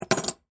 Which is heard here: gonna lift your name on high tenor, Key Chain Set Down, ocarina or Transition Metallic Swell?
Key Chain Set Down